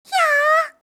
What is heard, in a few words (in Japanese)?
hyaaa; gaya